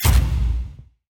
win hit; Wazdan

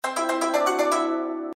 multiplier increment; Ming Imperial Guards

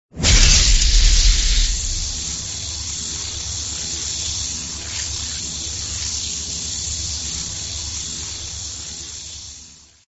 buff thunder; Thunder Fighter

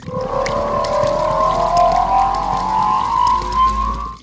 reel long spin; Hungry Shark; Wazdan

